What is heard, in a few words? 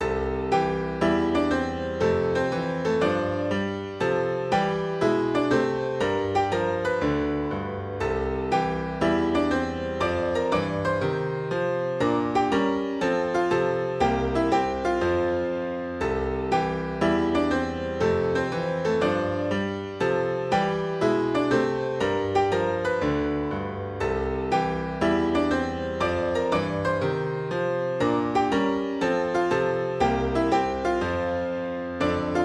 four hand shuffle